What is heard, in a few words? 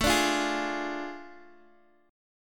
A Major 7th Suspended 4th Sharp 5th, Guitar, Open D; chord